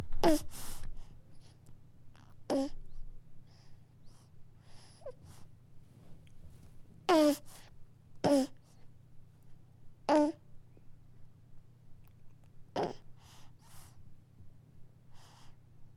baby pause in breastfeeding

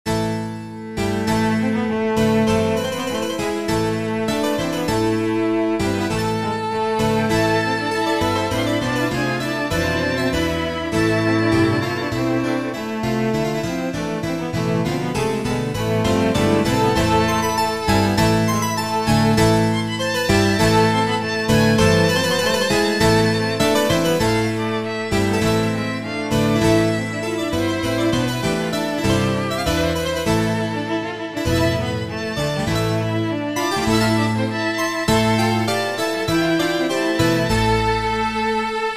harpsichord suite; ens. cordes

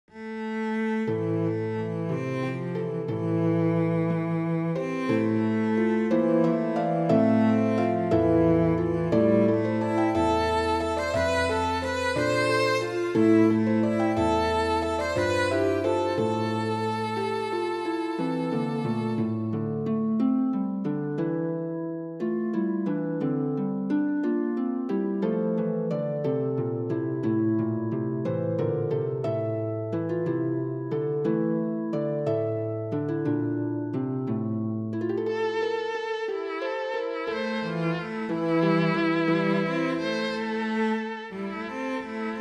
violin, cello, harp

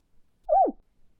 doop

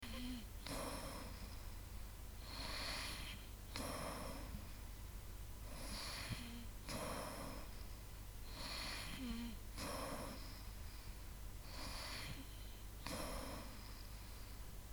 heavy breathing while sleeping

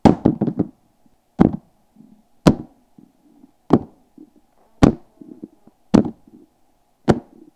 book drop

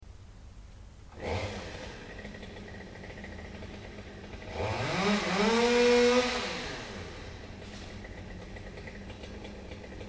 chainsaw

